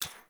Menu Hover, UI; Warframe; Awakening Remaster

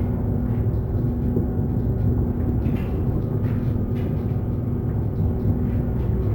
Elevator Loop; Warframe; Caliber Chicks; minigame

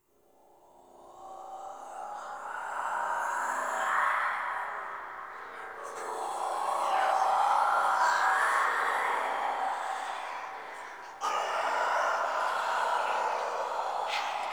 Whispers; Muruk Vull; Warframe; Ambience; Entrati

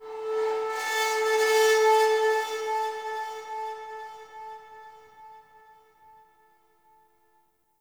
Corpus Ship Metal Scrap Texture Med; Textures

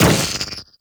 Proj Generic Whoosh; Warframe; Lizzie

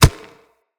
Kubrow Step Dirt, Footsteps

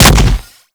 Impact Flesh, Weapons; Warframe; Furax; Impacts

